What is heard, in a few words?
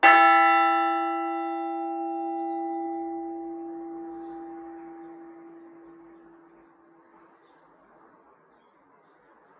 bells of sussex